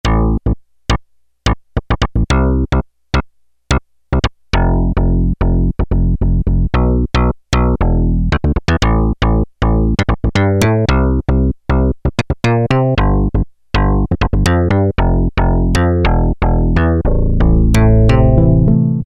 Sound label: Syn Bass, Roland W-30; Factory Performance Patches